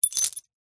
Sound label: industrial bolts metal few small set down